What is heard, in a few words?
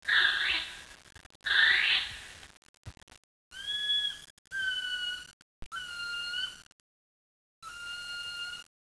brown kiwi